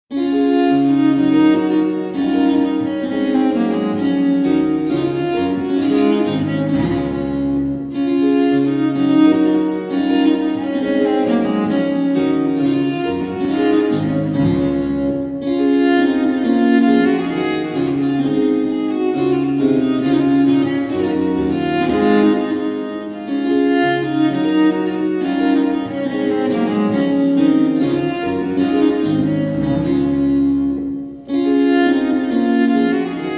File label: pipa